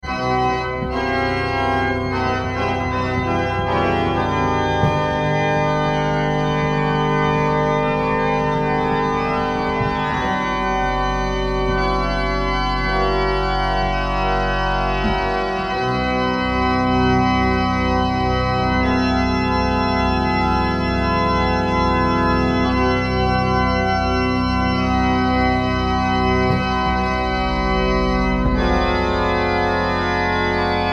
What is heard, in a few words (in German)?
Orgel Improvisation